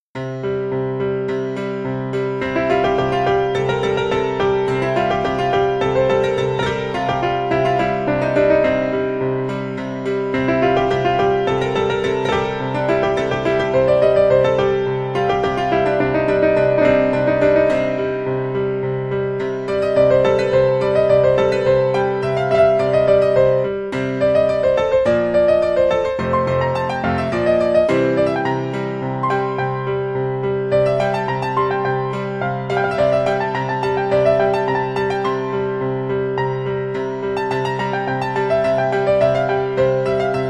mixolydian indian

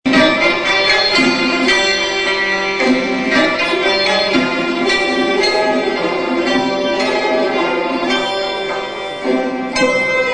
Reng, Ensemble

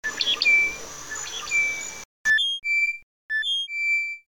Agelaius phoeniceus

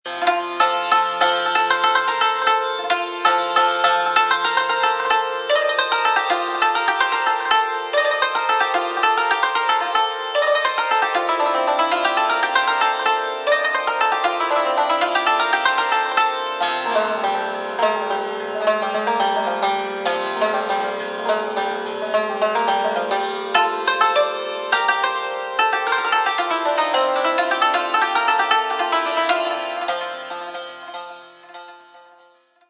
Chand Mezrab Panjgah Rast Panjgah

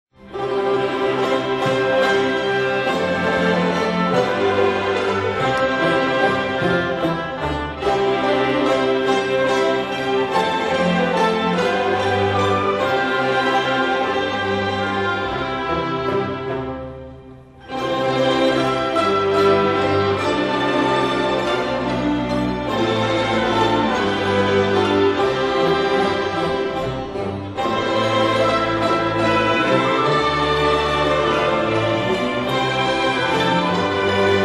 orch sample

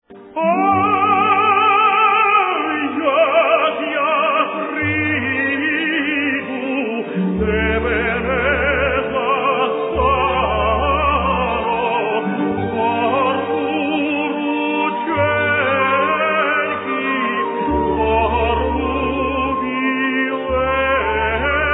Voices higher than tenor

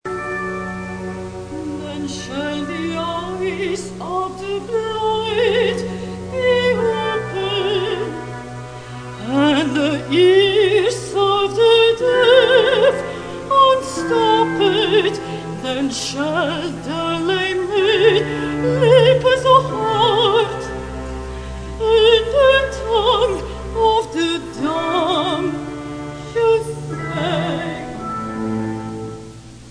Contralto

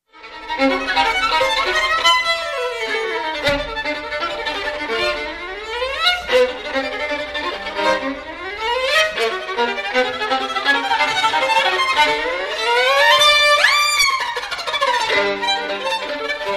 violin

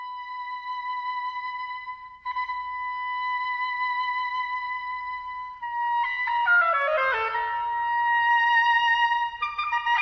oboe

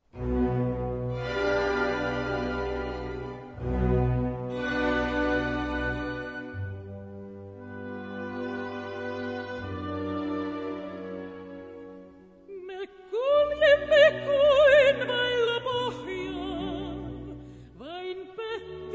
Dramatic; دراماتیک سوپرانو (Dramatic soprano); صدای قدرتمند – گیرا که میتواند قوی تر از ارکستر بخواند

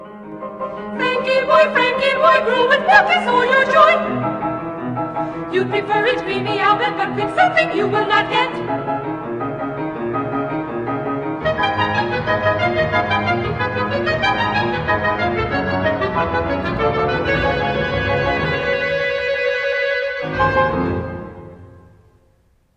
Nursery Rhymes

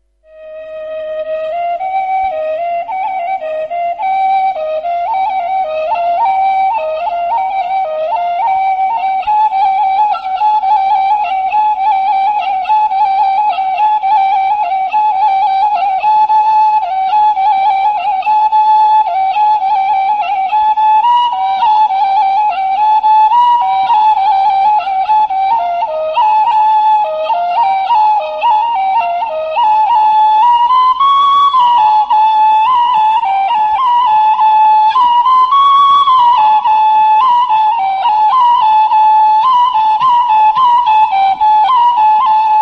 Ney Mahali Gilaki Ney Kutah

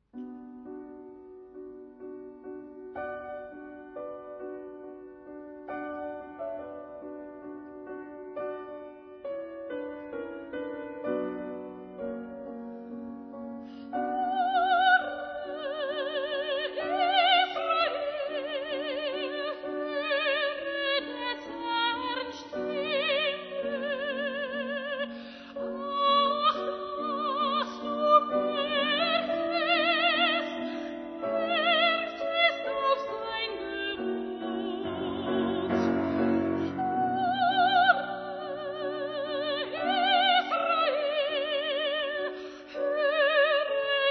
Spinto soprano

